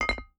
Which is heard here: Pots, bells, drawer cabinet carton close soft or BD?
Pots